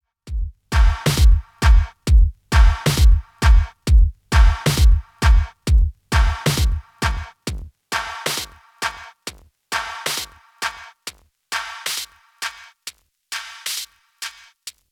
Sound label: Master HPF min. Resonance